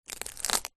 food garlic clove crush with knife; zapsplat

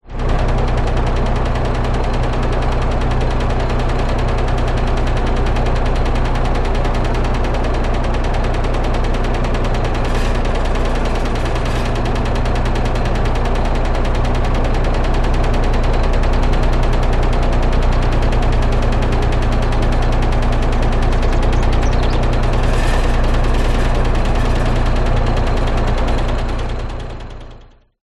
Old Window Fan

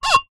drinking glass rub squeak creak finger; foley

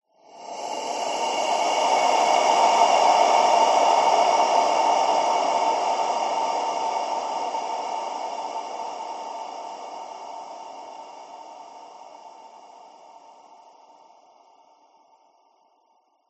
horror breath deep reverb ghostly

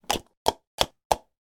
household cups plastic beakers 4 stack quickly; sound effects one

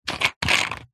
food drink bottle 1 litre plastic lid turn open; pepsi; zapsplat

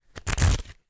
leisure playing cards pack flick through

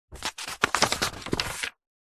household book wordsearch magazine page turn; zapsplat

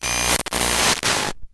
glitched tones AM Radio Interference

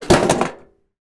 small metal case tin down on ground; foley; zapsplat

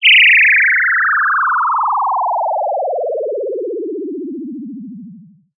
cartoon descend stuttering simple fall

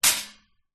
shopping cart metal flap close; foley; zapsplat

